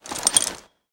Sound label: chariot bridle; smite; Apollo